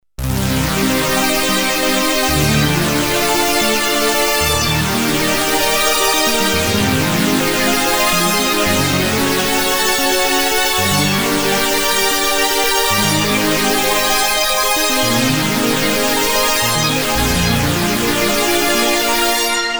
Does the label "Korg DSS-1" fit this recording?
Yes